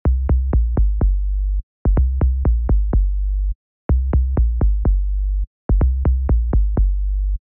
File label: synth oneshot moog